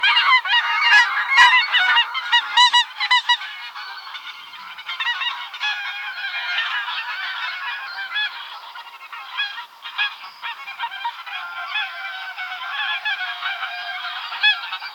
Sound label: geese making sound